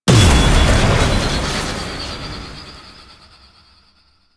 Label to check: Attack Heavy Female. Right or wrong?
Wrong